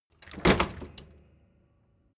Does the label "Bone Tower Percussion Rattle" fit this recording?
No